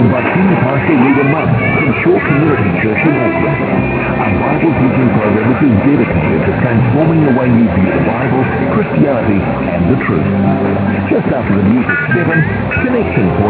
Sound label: 648 nz rhema, AM; Grayland Beach State Park, WA; Perseus & various antennas